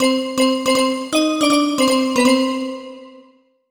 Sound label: Bell Voice Negative; startide macos switch windows gamerip 2017